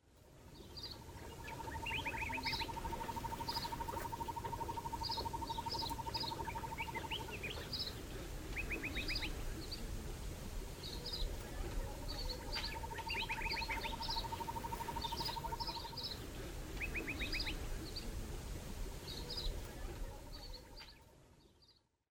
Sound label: Ambience; Age of Empires III; cinematics